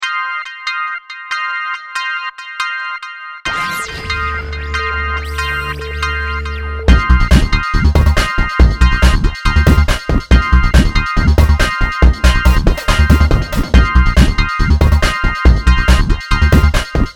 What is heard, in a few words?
beat; moh samples